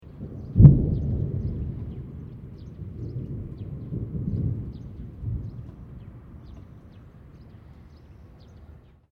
THUNDER DISTANT LOW BIRDS; Elemental weather effects

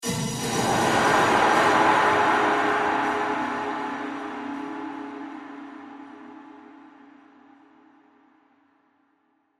Horror Hit High Delay Airy Swell; Blastwave

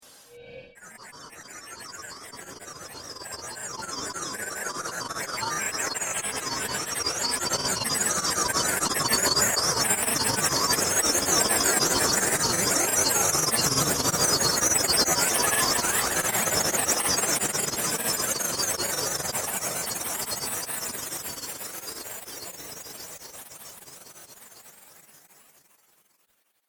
Androids Mechanical Meltdown, robot; science fiction sound effect; star wars / trek style games; Andrew Fleming

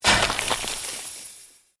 Created Magic Spell Ice Bolt; Series of created spell sounds

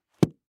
Book Large Cover; Book Cover Close Fast; Book Sound Effects; Offices; Foley; Blastwave

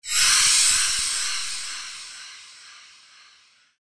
Dragon Wizard Spell, magic sound effects; Fantasy sound effect; role playing games, magic videogames

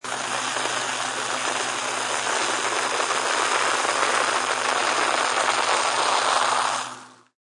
Soda fizzes and carbonates.; Soda Guns; Bars

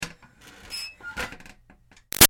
Ironing Board Metal Move Slow Screech; Miscellaneous Household; Big Room Sound

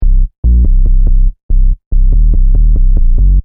Techno Trance Sub Bass Loop In C 142 Bpm

